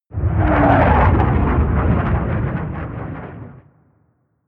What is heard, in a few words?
air strike